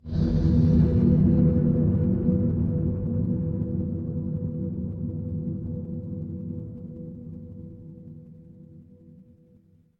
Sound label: horror stinger